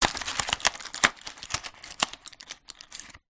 household medicine paracetomal foil strip remove from box